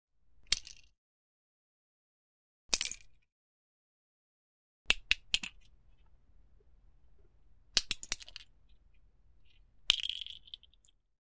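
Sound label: nut shells thrown on the floor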